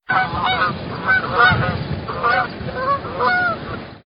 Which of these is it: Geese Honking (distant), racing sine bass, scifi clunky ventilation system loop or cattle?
Geese Honking (distant)